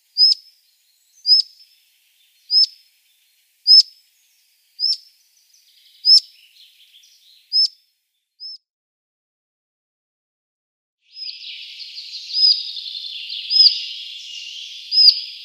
Collared Flycatcher calls

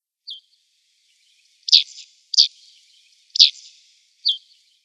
Black-headed Bunting calls

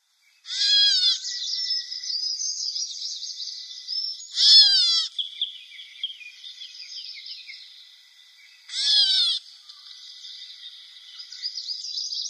Grey Catbird calls

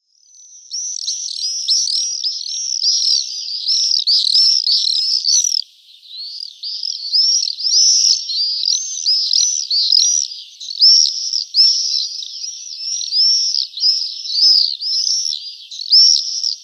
Common Swift flight calls

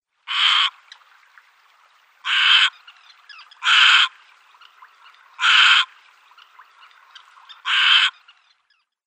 Little Egret flightcalls

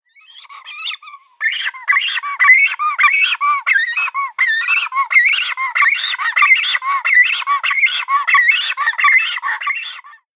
Great Cormorant nestlings

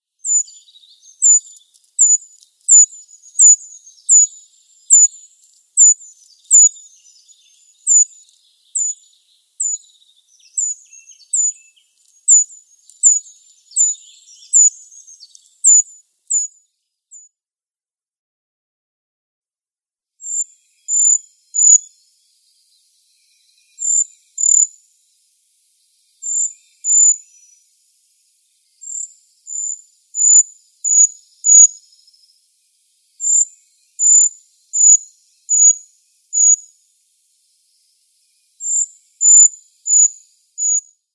Eurasian Treecreeper calls

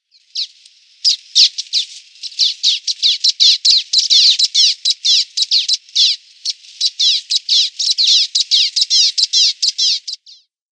Common Waxbill calls

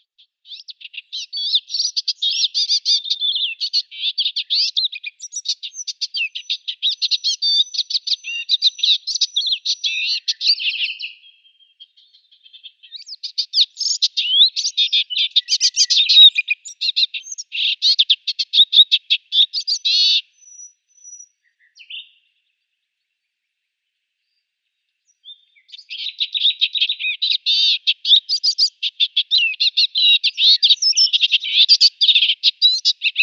Western Olivaceous Warbler song

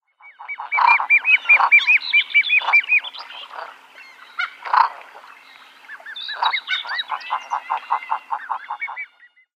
Common Eider downy Young

